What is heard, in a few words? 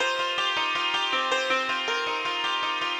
ethno, 160, C